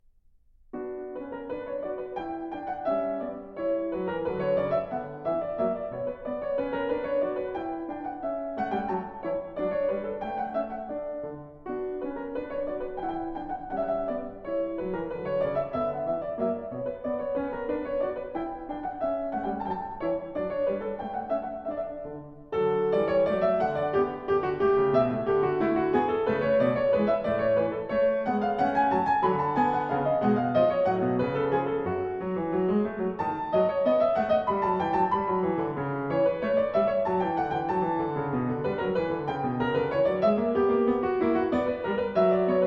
French Suite No.3 in h-moll, BWV 814 - IV. Anglaise; Bach